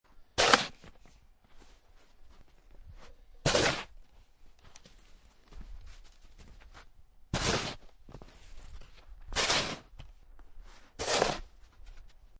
Shoveling Snow Medium Soft Snow; Digging Sound Effects

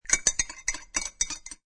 Stirring With A Spoon In A Cocktail Glass; Drink Mixing

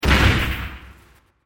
Bursting Elements; Bursts; Impacts; Crafted Signals

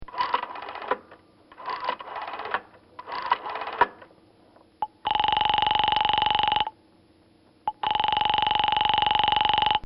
1920S Phone Dial And Ring

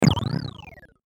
Digital Blurp; Blastwave

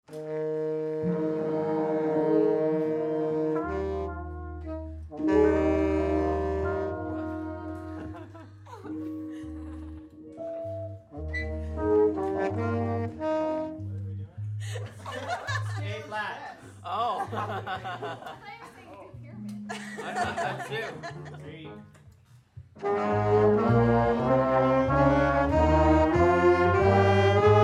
Music Class Band Scale Exercise, Orchestral; Musical; Big Room Sound

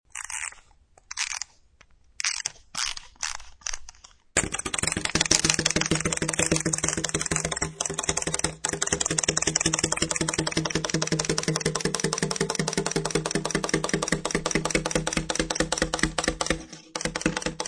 Winding up of toy rabbit and letting it hop.; Part of Wind Up Toys (Sound Effects:Toys)

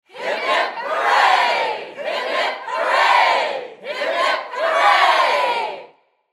Human Crowd Studio; Crowd Reactions Hip Hip Hooray Multiple

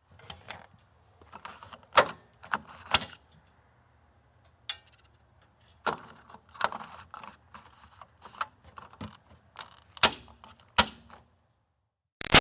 Car Highlander Gas Lid Remove Moves Tighten; 2005 Toyota Highlander V6; Cars; Vehicles; Big Room Sound

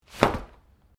Umbrella Open; Umbrella Sound Effects; Objects

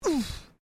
FEMALE GRUNT; Female vocal sounds; Grunts (Sound Effects:Voice)

